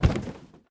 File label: Box Jump; MiSide